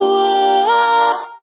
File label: "woah" SUNG; ooh ahh